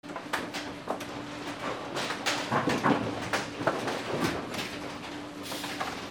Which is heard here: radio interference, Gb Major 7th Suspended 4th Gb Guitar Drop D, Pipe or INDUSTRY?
INDUSTRY